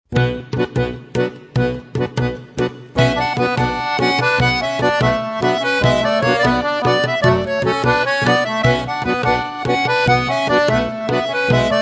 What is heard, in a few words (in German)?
Cetvorno Sopsko Horo Bulgarien, Accordion; Accordion Trip 2